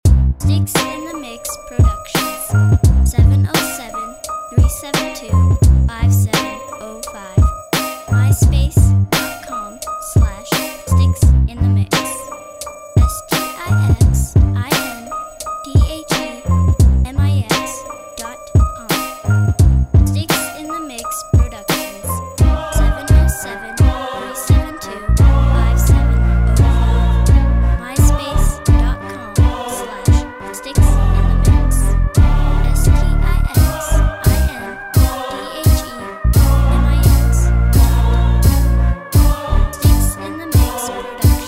west coast sopranos, beats

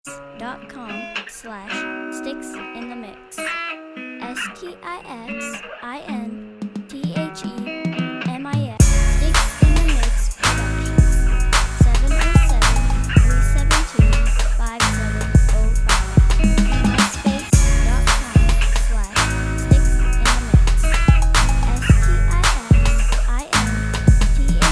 guitar uptempo

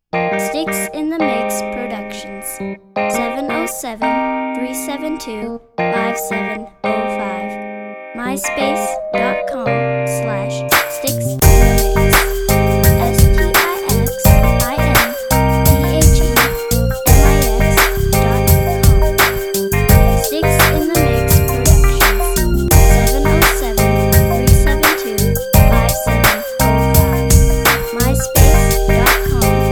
smooth gfunk

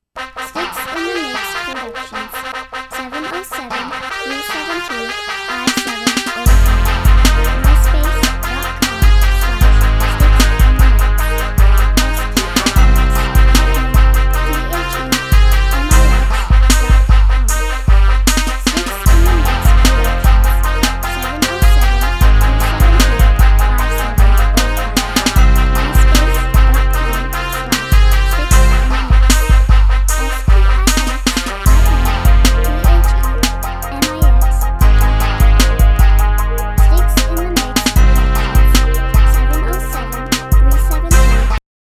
crunk face

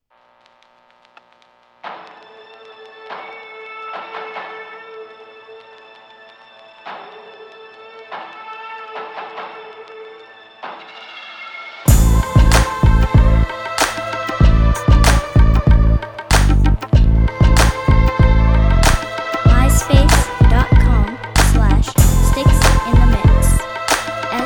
jungle 95bpm

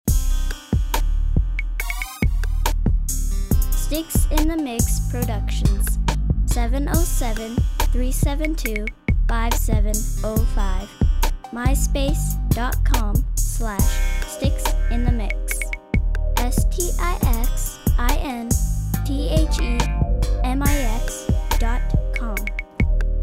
slap slo; mob

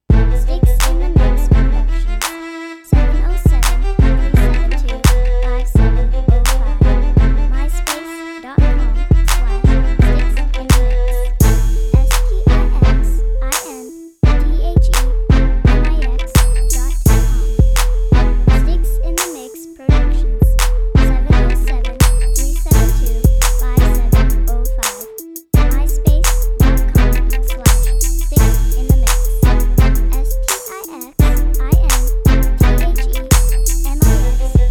classical

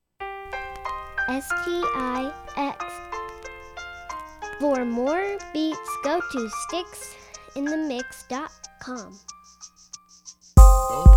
piano; piano stix

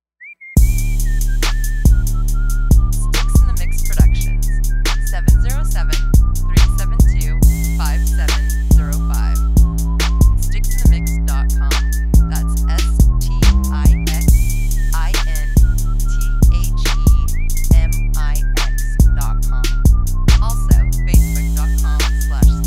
The Whistler

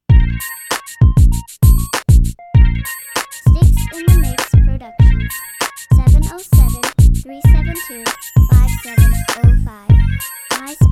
rap beat

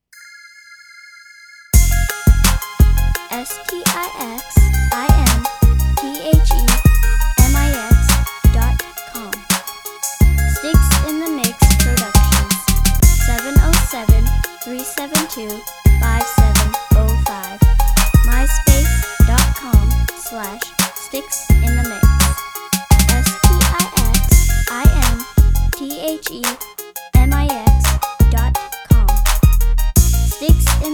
smooth gangsta, beat

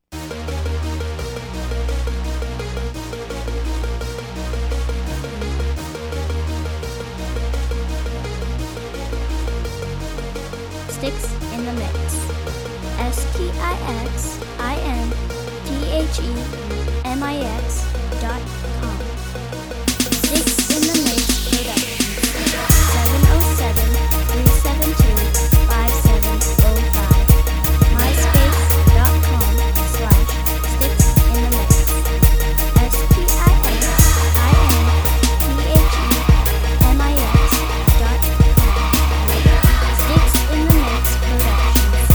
we rock, beats

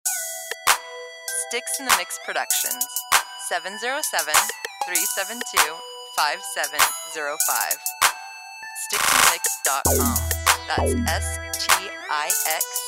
Mob Squared

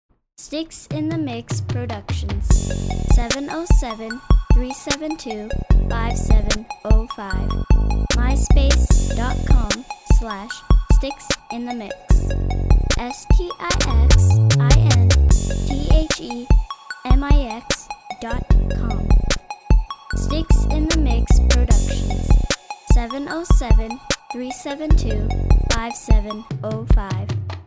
squared up

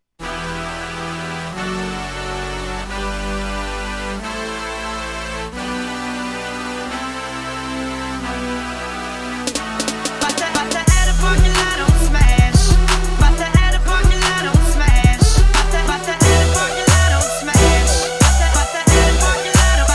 On smash, beats

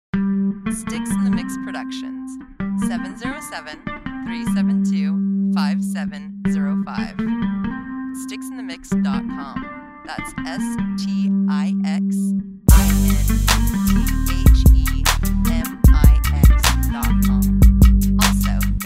guitar

